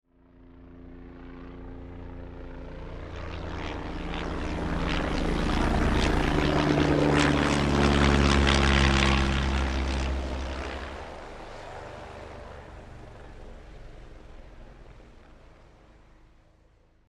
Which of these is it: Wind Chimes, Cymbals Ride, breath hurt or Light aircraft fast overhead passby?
Light aircraft fast overhead passby